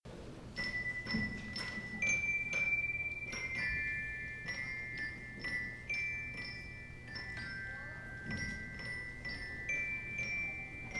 Percussion

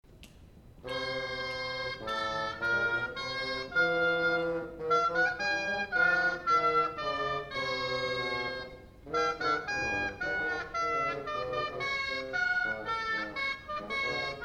Double Reeds

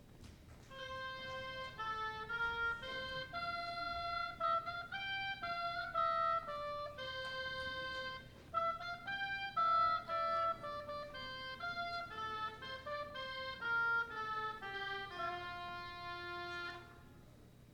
Oboes

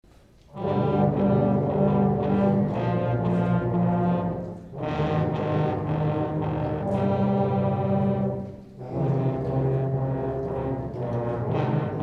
Low Brass

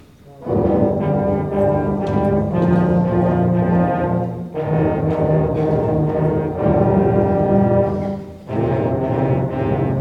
Low Brass Class Feature

